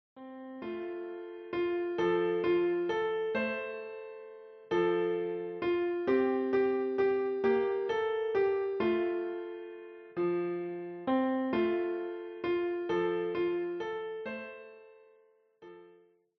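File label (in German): Ich geh mit meiner Laterne, Klavier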